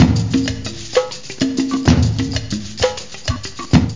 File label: perc loop; OctaMED SoundStudio V1